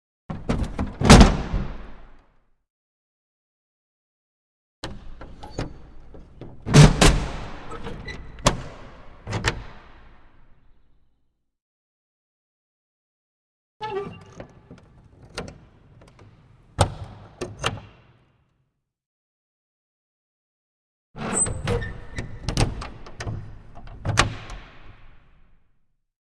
Window With Bar-lock Mechani, Castles Palaces & Monasteries; Hollywood Edge Historical Series; cd4 Castles Palaces & Monasteries